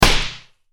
ツッコミ打撃

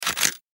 カメラシャッター

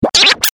スクラッチ

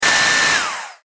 中型ロボットの駆動音

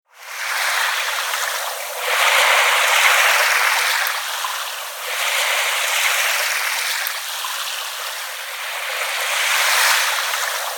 さざ波 ループ

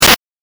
8bit ダメージ

